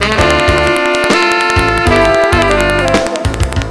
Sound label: Ocean Verse, Jazz; Sound Factory